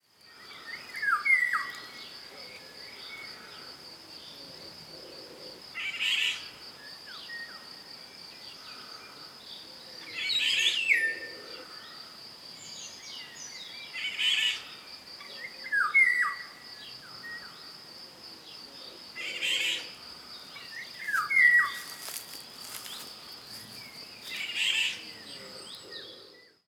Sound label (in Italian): Rigogolo Oriolus oriolus